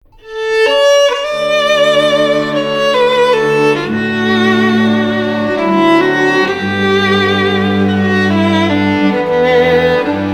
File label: Celtic Americana Quartet, Classical String Quartet